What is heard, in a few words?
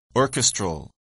orchestral